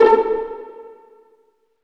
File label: STR PIZZ, String Sections, Violins Pizz; Vls Pizz; Roland LCDP13